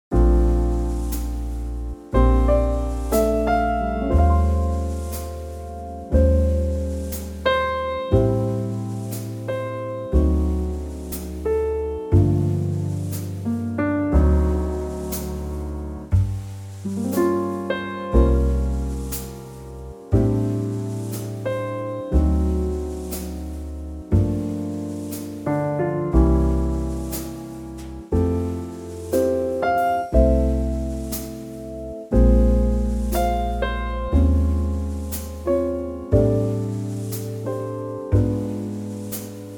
F trio

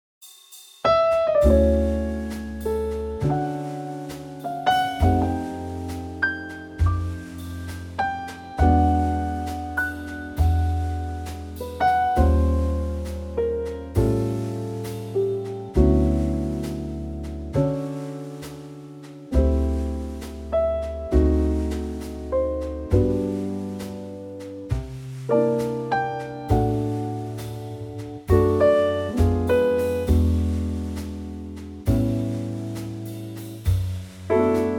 D trio

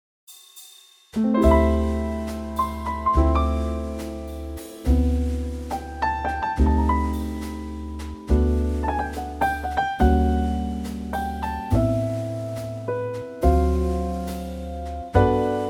G trio; key - G - vocal range - D to E; Gorgeous Trio arrangement

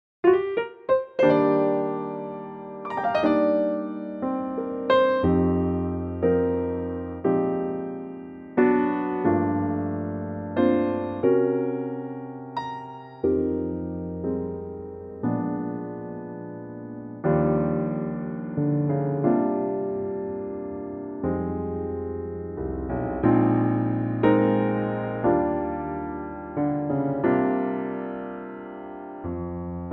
Eb piano; key - Eb - vocal range - Bb to Db; Superb piano only arrangement of this wonderful blues tinged standard, with the verse included.

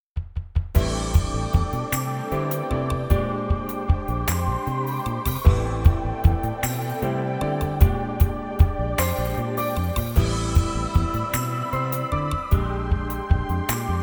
smooth; key - C - range - D to E; Another fresh arrangement of the classic standard, smooth grooves and cool changes.

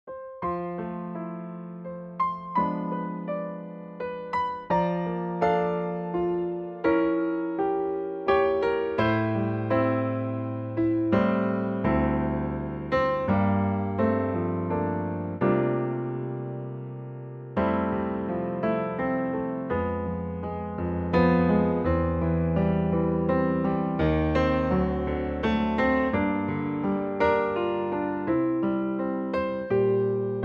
F piano; key - F

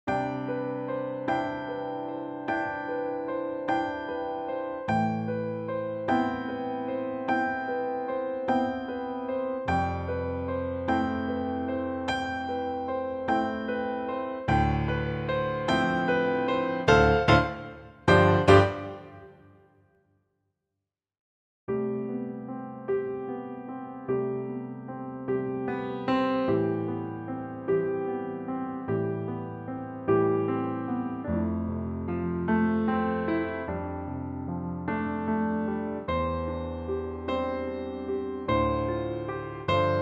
C piano

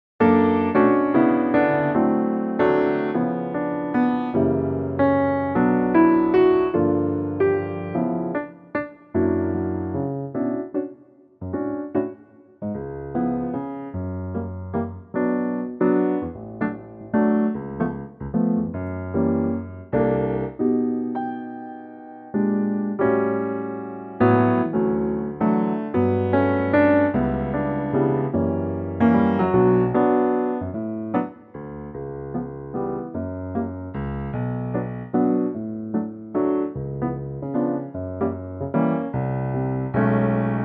Bm piano

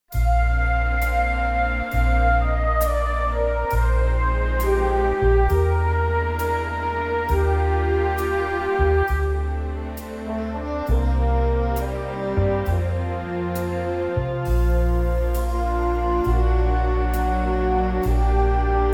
orch Bb; key - Bb - vocal range - F to Bb

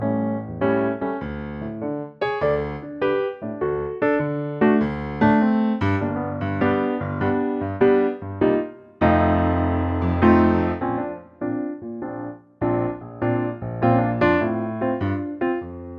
medley G Bb piano

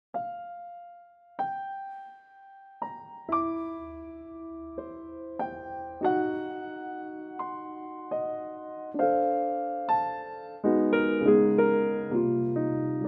Bb piano; key - Bb - range - C to Eb; Pianotrak

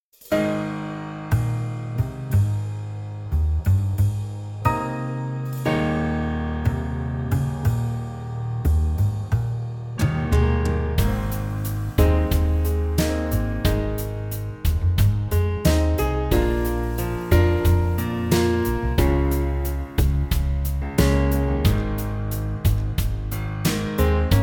A Trio

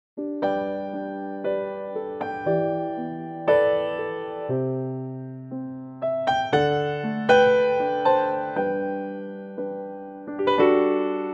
G to A piano

